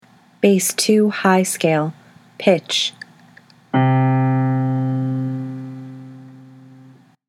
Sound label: bass scales high; all-state choir